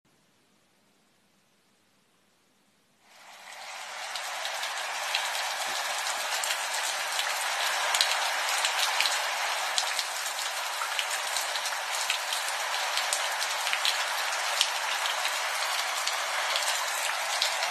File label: Rainstorm background